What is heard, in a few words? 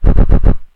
Kung Fu swish; attack